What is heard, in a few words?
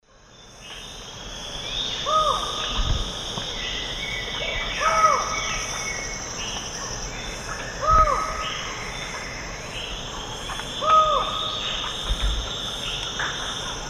Micrastur semitorquatus, calls; S. America; Eastern Lowlands